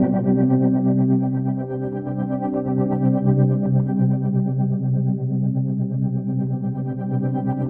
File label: TREMO WASH; Distored Reality 1&2